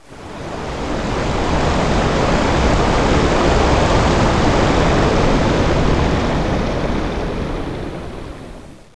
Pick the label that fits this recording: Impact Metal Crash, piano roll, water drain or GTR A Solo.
water drain